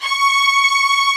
HI ST STRING

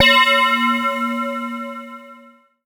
C#, BELL GONG, Synclav FM; Big Fish Audio

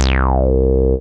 303 Bass, Old Skool House; Big Fish Audio; OSH Bass

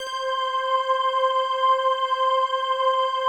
PAD, DIGI-PADS; Best Service; XX Large Pads; Sample CDs

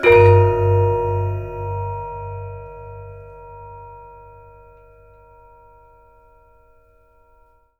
GAMELAN HIT; Spectrasonics; Heart of Asia; GAMELAN SET

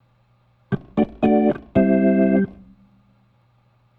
Organ, 70s Analog Funk

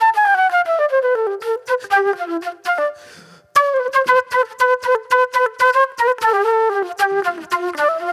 Old Soul Flute

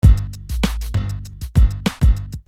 Beat, Hip-Hop Street Beats

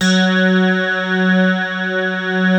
basses; Wizoo - Powered Wave

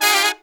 Pop & Funk Brass

